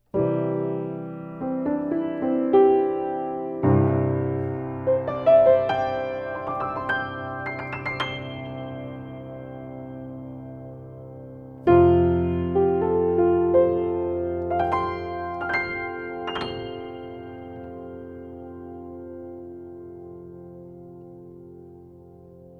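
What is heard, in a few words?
Piano, vintage AKG C414 COMB condenser; SHAFER BABY GRAND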